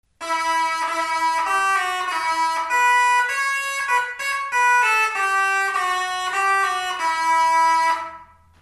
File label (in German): schalmei d